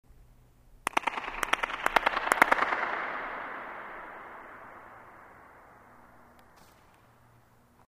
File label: claps centre; Trevor Cox; SoundBook